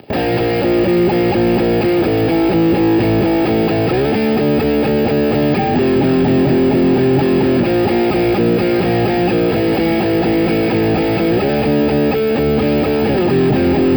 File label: lead middle gibson; custom77 cs4